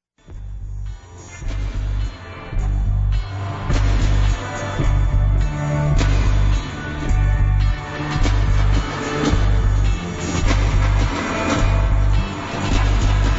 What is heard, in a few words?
reggae